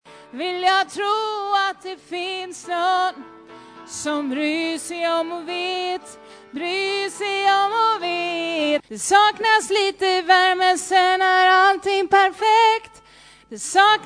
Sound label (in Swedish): bas, stammor